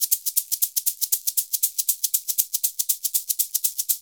SHK, Shaker; Univers Sons; Basicussions

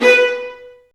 String Sections, Violas Marcato; Roland LCDP13; STR Vas2 Marcato

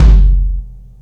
Kick, Kick One-Shots

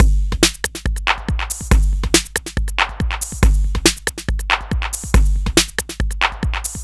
Break Pattern, Drums

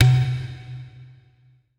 E TABLA B

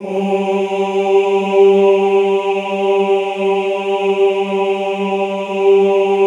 OOH G2, Classical Choir; Best Service; Extended Classical Choir; OOH MIX

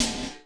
RIM GATED; Crypton; DrumBase5000

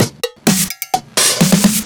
Fills 128BPM, Clubsounds

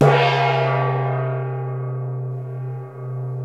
GONG; Crypton - DrumBase5000

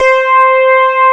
DX SYN SOLO

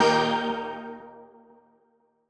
Old Skool D#3

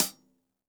hihat - closed; JazzFunkKit

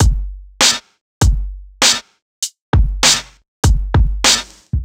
Raw Brk HAT; Rawcutz - Nu Forms

